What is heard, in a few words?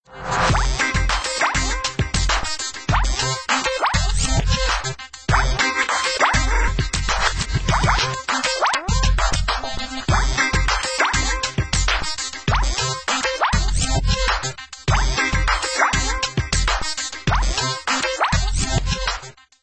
Ring; Samsung; SGH-i617 BlackJack II; HighScore